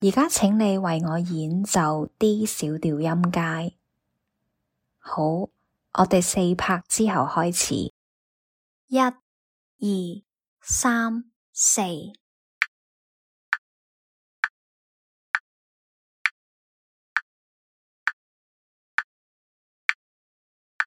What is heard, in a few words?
D minor scale